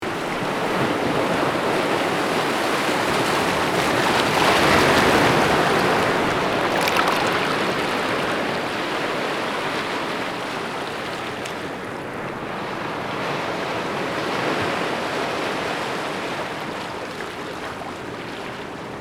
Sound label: breaking on beach